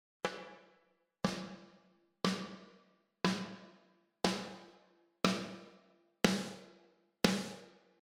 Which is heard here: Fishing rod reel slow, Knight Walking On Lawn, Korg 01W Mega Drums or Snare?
Snare